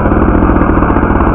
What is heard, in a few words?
20mm gun; A6M2; Bombable; flightgear